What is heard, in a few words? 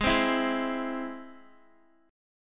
Ab Augmented Ab, Guitar, 4E, 4A, 5D, 5G, 5C, 6F; x,11,10,9,8,x; Ab+ strummed